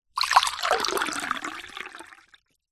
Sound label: food drink water pour into small drinking glass